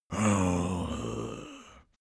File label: zombie moan idle